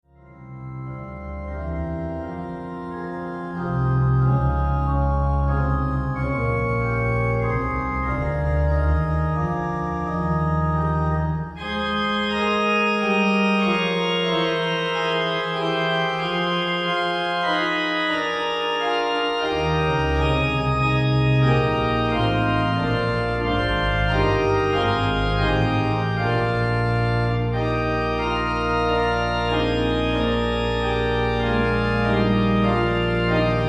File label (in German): Tuer Orgel; Klangkonserven; Singkreis; SingkreisStudio